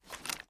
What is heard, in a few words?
reload start beginning, weapons; gmod; viper